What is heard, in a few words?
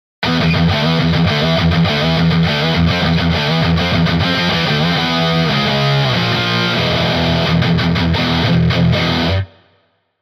CUSTOM METAL